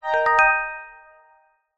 multimedia alert positive bright; zapsplat

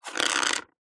office pencil roll wooden table

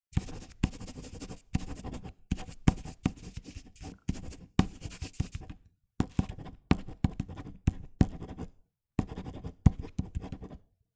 office handwriting fast ballpoint pen paper

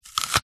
human eat bite apple

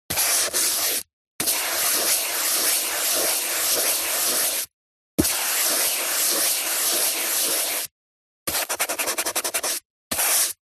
Colouring Paint Brush Paper; D&H; soundbox library

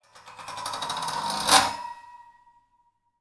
foley metal scrape grill small

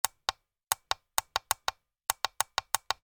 household led light plastic button press multiple; zapsplat

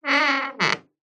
household door wooden creak short

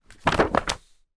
paper pack drop down on surface

